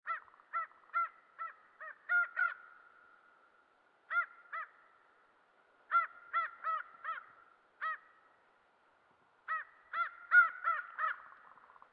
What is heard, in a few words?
ambient crow calls